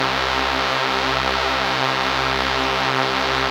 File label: thin raver, ms20 layers